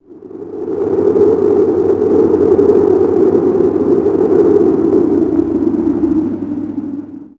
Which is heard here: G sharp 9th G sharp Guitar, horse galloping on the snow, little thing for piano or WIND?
WIND